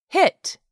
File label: hit